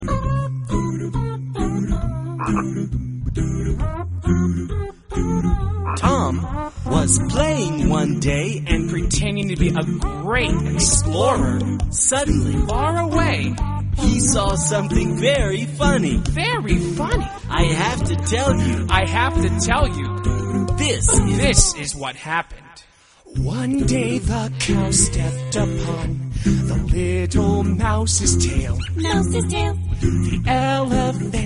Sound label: tom